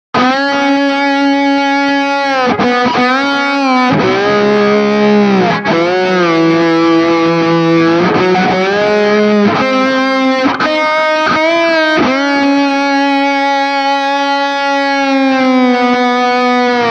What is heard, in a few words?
Intro Guitar Right